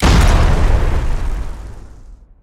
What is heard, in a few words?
explode